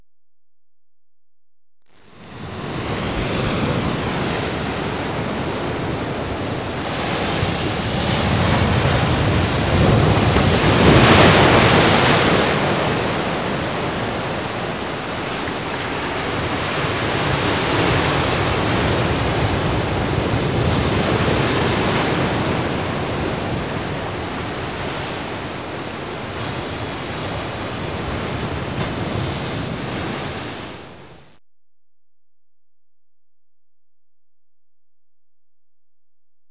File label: close; Normandy - The Great Crusade